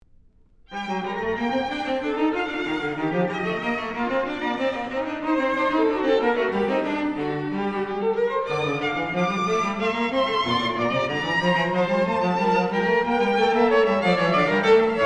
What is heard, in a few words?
Concerto Grosso in G Minor